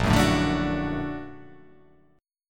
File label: A sharp Augmented Major 7th A sharp, guitar